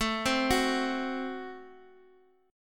A Minor 6th A, Guitar, Standard; Am6 arpeggiated; x,x,x,2,1,2

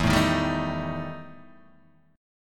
E Minor Major 7th Double Flat 5th, Guitar, DADGAD

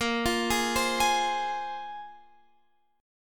C 7th Suspended 4th Sharp 5th

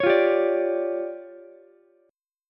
D Minor Major 7th Double Flat 5th, D, Ukulele; DmM7bb5; strummed; JGuitar

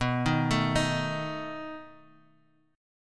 B 7th B

